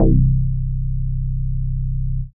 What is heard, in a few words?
BASS STER.